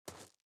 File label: SFX run gravel; gamerip; Out of Reach: Treasure Royale